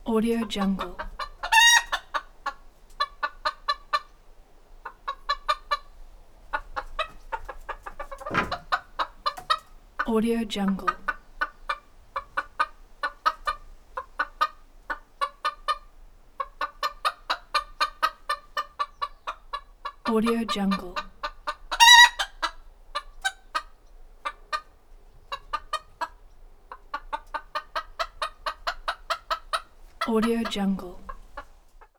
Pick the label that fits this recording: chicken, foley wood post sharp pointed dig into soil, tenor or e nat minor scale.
chicken